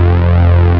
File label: car